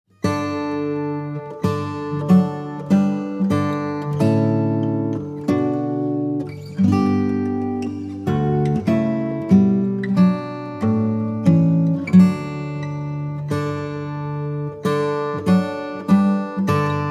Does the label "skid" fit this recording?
No